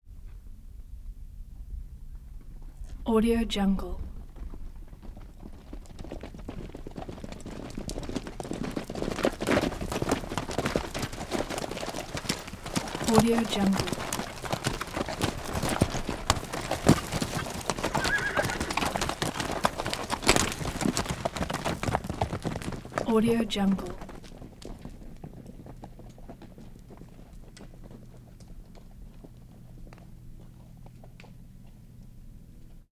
four horses trotting in milling about neighing; prosoundfx

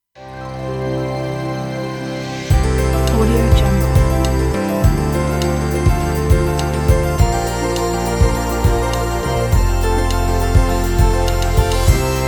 inspiring introduction

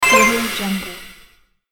collect reveal atmospheric

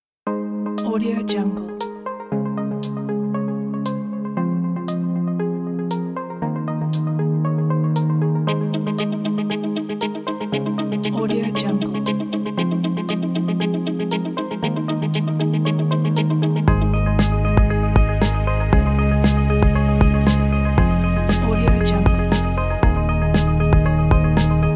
corporative

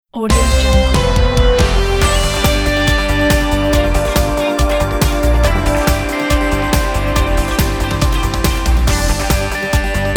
energetic news and sports

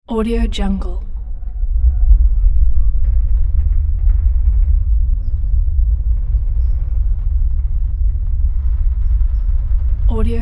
large castle door open or close

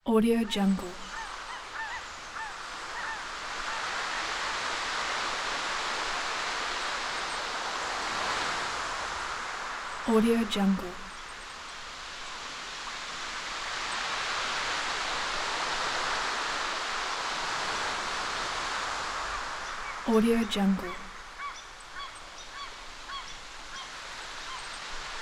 beach waves and birds

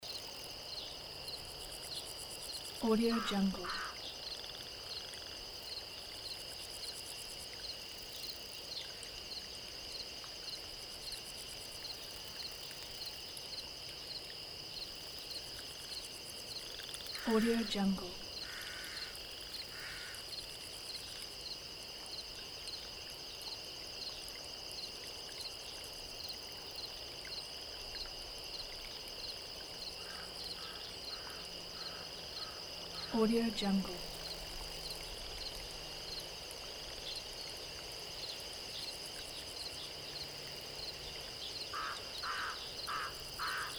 marsh swamp ambience loop

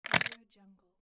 gamepad controller drop

